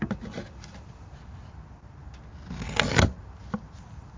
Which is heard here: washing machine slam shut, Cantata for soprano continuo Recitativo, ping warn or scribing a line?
scribing a line